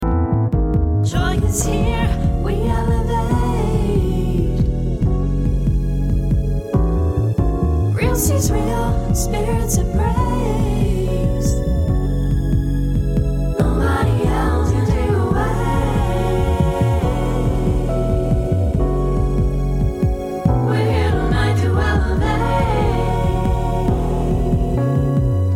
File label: kick drums